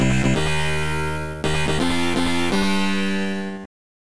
Stage Clear; X-Out; Amiga

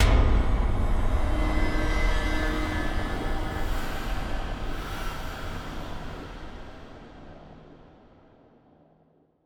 Stingers sting pain huge

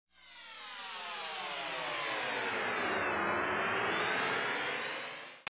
Splash; MechWarrior 2: 31st Century Combat; IBM PC; MS-DOS

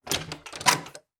Door Open

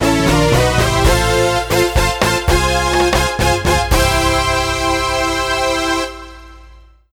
Eagle; Super Monkey Ball 2; Monkey Golf 2

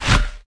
Footsteps; SFX Character

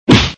Hit; Chibi Knight

